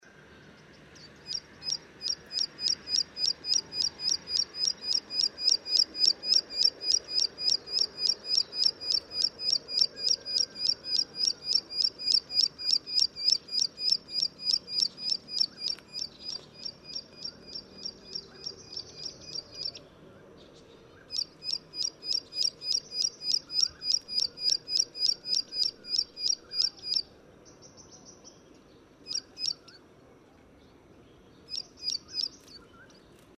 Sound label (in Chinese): 褐頭鷦鶯; 純色鷦鶯; 扇尾鶯科/留鳥